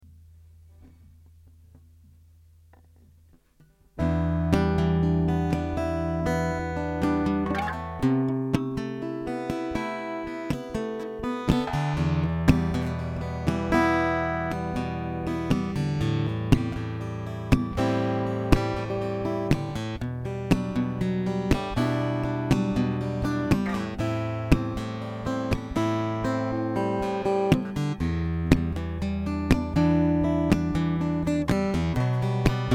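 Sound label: Em 120 chord progression dry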